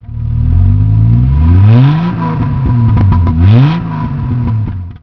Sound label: tr6 carb, classic car